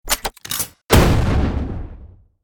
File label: Anti personnel mine detonating explosion